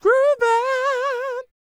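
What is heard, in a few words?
falsetto, R&B Dance; Spectrasonics Vocal Planet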